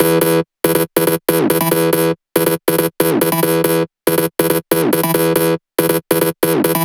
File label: 140BPM E; Vengeance - Essential Clubsounds Vol.4